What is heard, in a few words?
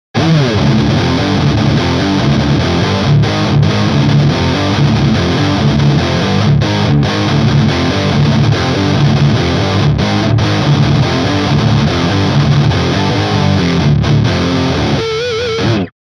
chorus